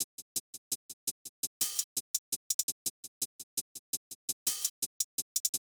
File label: Drums; Hip Hop; Apple Loops; Big Fish Audio